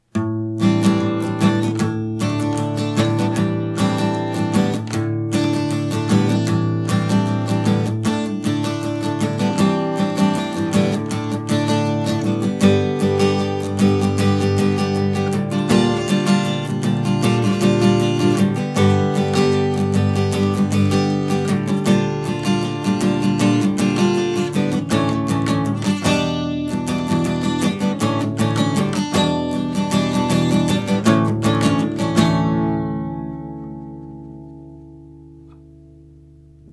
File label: Acoustic Guitar